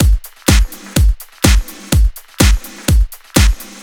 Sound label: Big Room Snare, Drum Loops, 125 BPM; Sonic Foundry (Sony Creative Software); Underground UK House & Electro